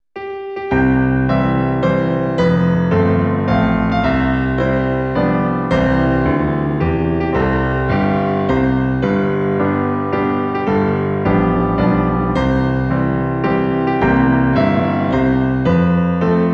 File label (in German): Zum Geburtstag viel Glueck in C Piano